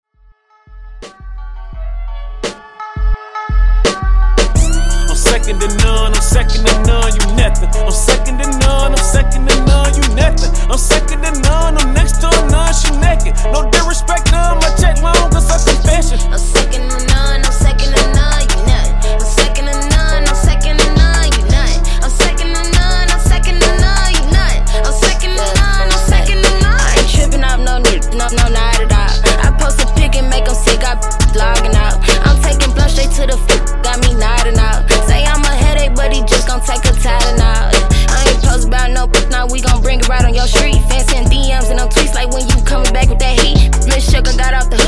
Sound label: Dj Intro Outro 85Bpm